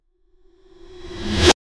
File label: dark trap reverse impact